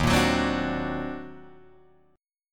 Eb Minor Major 13th, Guitar, DADGAD

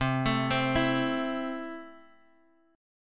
C 6th C down Guitar Drop D

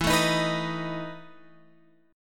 E Major 7th Suspended 4th E, Guitar, Open G

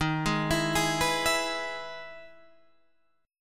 E-Major D sharp

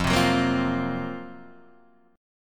F sharp Major 7th Flat 5th F

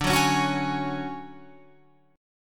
D Major 7th Flat 5th Guitar Open F, chord; strummed; 14,13,13,13,16,15

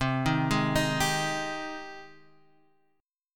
G sharp Major 7th C

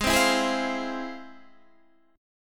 F Minor Major 7th, G sharp

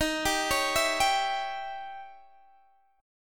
D sharp 7th Flat 9th, Guitar, Open G

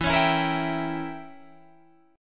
E Diminished 7th E, Guitar Drop D

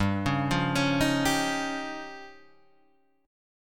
F 7th Suspended 2nd Sharp 5th

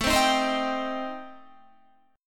A-Minor 7th Flat 5th, Guitar, chord; Am7b5; strummed

